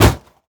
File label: plastic hit; teardown ps5 windows xbox series xs gamerip 2022